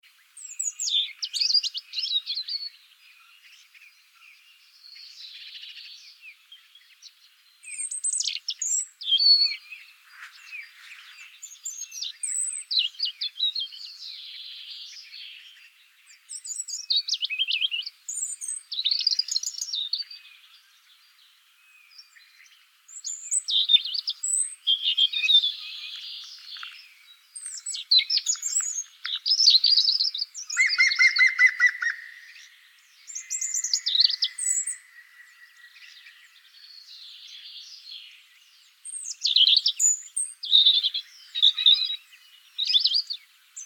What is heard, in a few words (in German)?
Rotkehlchen Gruenspecht; Ornithologie